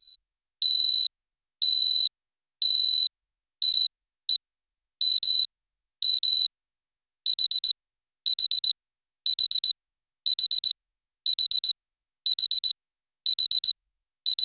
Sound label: heart monitor beeping; healthcare